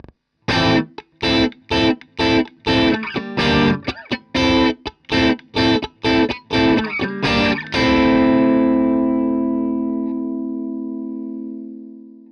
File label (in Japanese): リズム